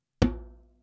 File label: Djembe